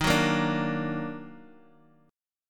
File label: Eb Minor Major 7th Eb down Bass Standard, chord; EbmM7; strummed; JGuitar